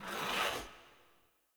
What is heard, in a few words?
Ur Piece Move; UI; Minigames